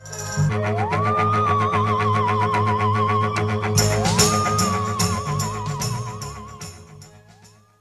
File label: vibration end, theremin; opto vco